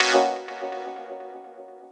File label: chord dance D, Chord Shots, Chicago House; Classic Chicago House